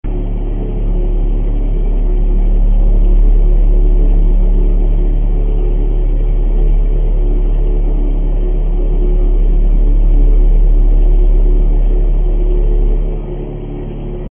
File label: deep unit basement atmo